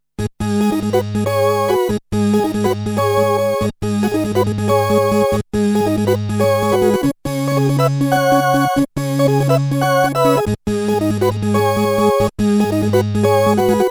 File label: organ jazz, Jazzy organ, Emu Planet Phatt; a Motorola MC 68000 CPU (mc68hc00fn10) system handles up to 32 voices of polyphony